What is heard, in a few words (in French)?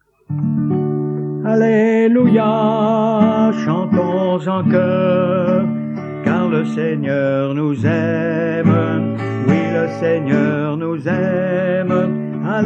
alleluia chantons en choeur car le seigneur nous aime